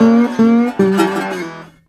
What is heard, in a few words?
Blues Guitar Samples; ILIO - Fretworks